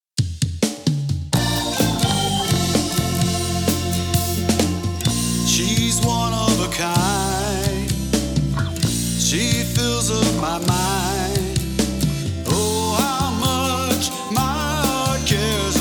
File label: pop